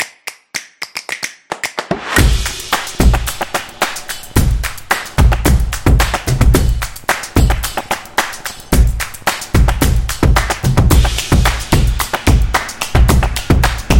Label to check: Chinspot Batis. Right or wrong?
Wrong